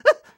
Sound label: tail fire vocal; Scrat